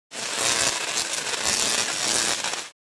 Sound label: Electricity spark or arc